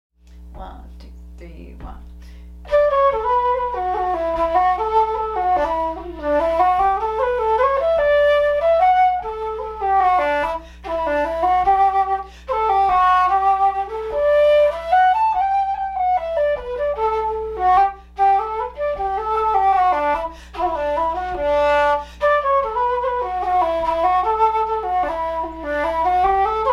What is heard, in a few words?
Waltz SOLO flute